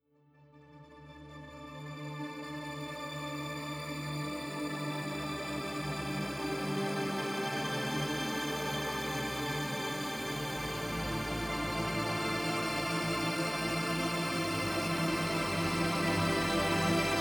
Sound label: Crystallized